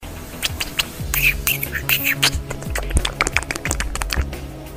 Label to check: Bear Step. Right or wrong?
Wrong